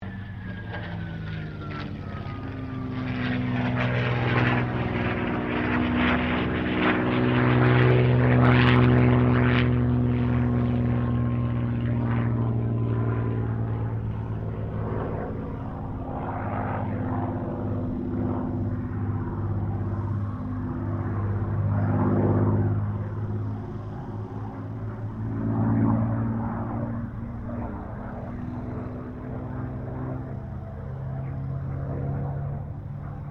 AIRCRAFT SUPERMARINE SPITFIRE MK-XVI CIRCLE STEREO; Airplanes